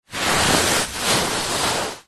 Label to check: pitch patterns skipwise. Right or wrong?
Wrong